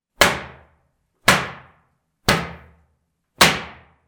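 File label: whipping sound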